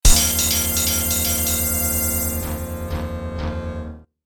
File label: Victory - Subway; Mortal Kombat 3; snes enhanced sound 1995